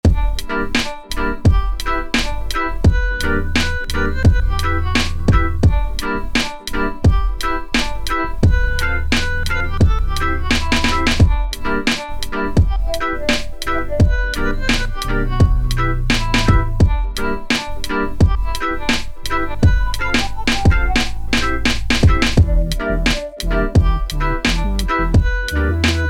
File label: reggae